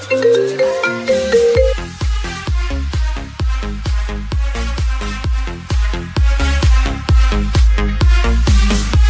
iPhone Mix Ringtone

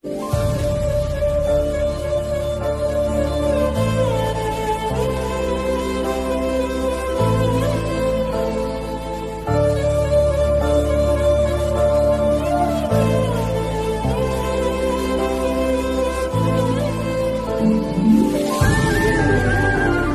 Punjabi Ringtone

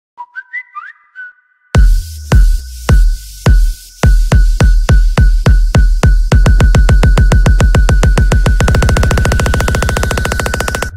Whistle Bass Boosted Ringtone; Samsung

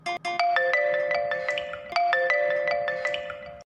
Vivo S12 Pro Sms Ringtone

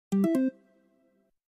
ZTE nubia RedMagic Android 8 Charging Sound

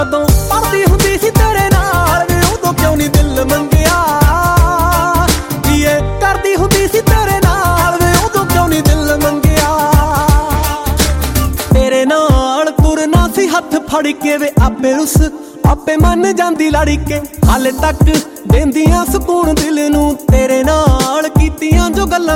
Punjabi Song Ringtone

